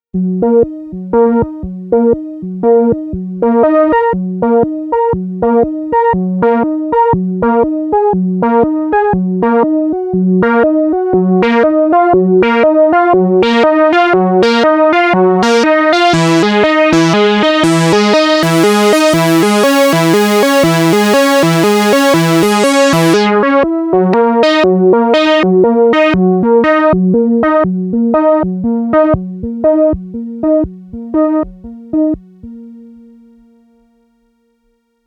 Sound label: DSI Mopho ARP Pulse